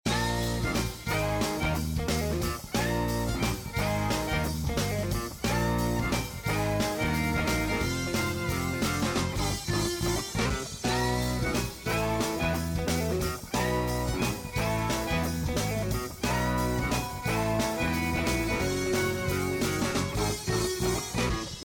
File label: free spin BGM loop; Big Paul